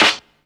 sizzle snare drum sample f key; snare-drum-samples

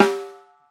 full snare drum sample g key; snare drum samples

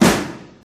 classic small reverb acoustic snare sample g key

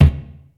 short reverb kick sample d key

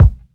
deep kick one shot a sharp key; kick drum samples

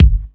low tone kick drum f sharp key; kick drum samples

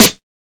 perfect snare sound f sharp key

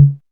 raw tom one shot c sharp key; Duration 0:01; Mono

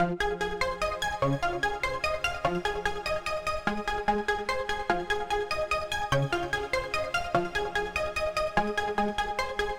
phrenetic background synth, A flat major; loops

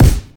clear kick sound f key; kick drum samples

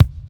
studio bass drum single shot e key; kick drum samples

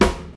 jazz acoustic snare f sharp key

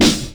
short snare single hit D# key

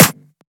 dirty snare sound d sharp key; snare drum samples; one shots

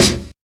natural snare drum sound g sharp key

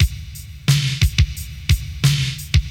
89 bpm hip hop drum loop sample d sharp key

